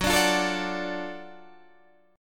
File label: Gb Minor Major 7th Sharp 5th, Guitar, Standard; strummed; 14,17,15,14,15,14; JGuitar